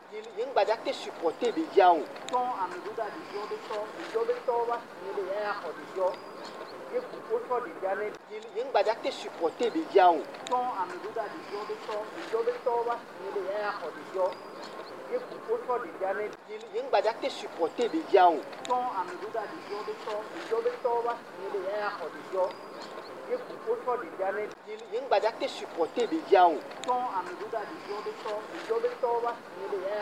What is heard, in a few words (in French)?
melodie